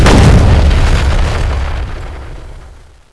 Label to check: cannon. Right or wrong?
Right